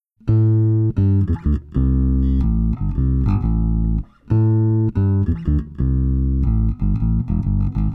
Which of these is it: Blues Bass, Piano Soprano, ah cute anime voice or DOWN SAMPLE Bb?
Blues Bass